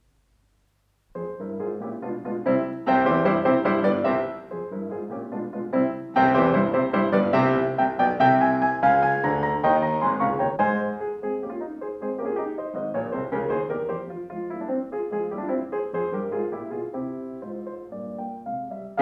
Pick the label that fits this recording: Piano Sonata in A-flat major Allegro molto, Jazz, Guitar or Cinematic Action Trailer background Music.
Piano Sonata in A-flat major Allegro molto